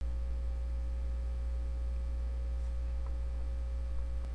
creature

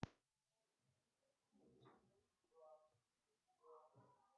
shot

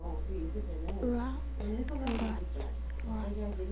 rat

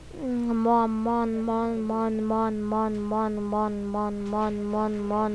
moan

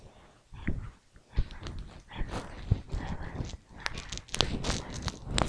hammer

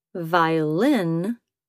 violin

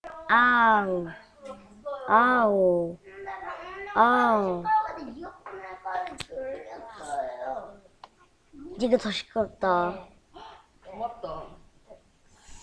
owl

